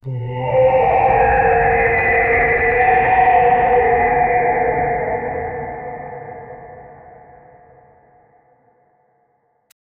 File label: the sound of a scary, monster